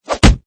Punch With Swish, Arm or leg swooshes through air and impacts wit; Arm or leg swooshes through air and impacts with face, body or object; Punch And Hit Sound Effects; Lynne Music